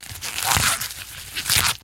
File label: Break and Crack, Vegetable Massacres; Mechanical Wave